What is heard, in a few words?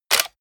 camera